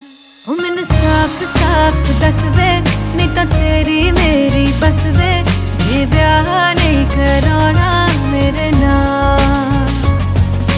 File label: Female Version Ringtone, Punjabi